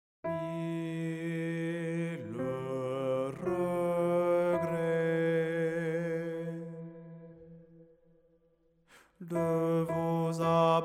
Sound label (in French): MILLE REGRETS ALTO